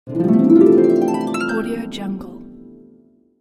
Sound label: harp transition; alexander blu